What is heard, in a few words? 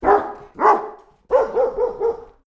Dogs Barking